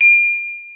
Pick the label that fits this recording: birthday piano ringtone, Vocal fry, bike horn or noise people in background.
bike horn